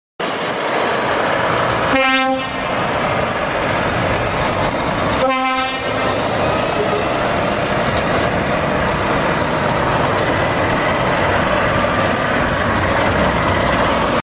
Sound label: Volvo 500 horn; ولوو ۵۰۰